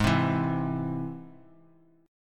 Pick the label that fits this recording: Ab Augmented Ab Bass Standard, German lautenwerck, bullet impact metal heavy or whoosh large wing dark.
Ab Augmented Ab Bass Standard